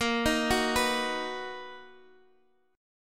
B Major, guitar chord; B/A#; x,x,8,8,7,7

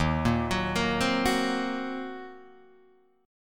Eb Minor 6th, Guitar DADGAD; arpeggiated

